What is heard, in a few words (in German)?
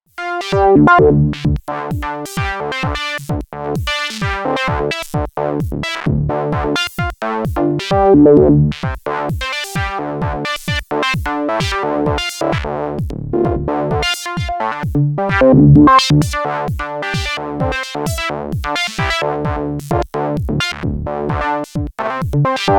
Novation Circuit Mono Station Polyrhythmisch; Session